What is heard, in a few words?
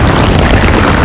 Snow Ball run